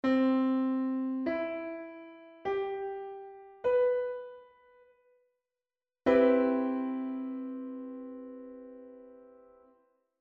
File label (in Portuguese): acorde C7